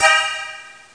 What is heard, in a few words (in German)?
violine; Amiga 8-bit Sampled Voice